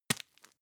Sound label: tissue tap hard